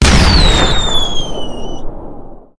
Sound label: shell, vehicles; combat; CosmicRage